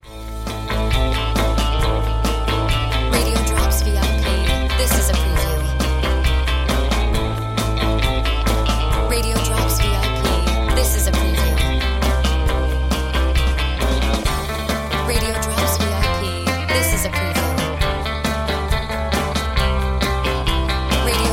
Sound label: Music Bed